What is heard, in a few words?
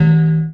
Ethnic Hit, One Shot Drums